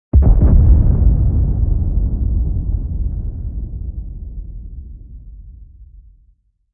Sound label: ambient; World of Tanks